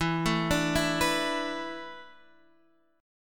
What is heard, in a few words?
E 7th Suspended 4th, Guitar, Standard; E7sus4 arpeggiated; 12,12,12,9,12,x; JGuitar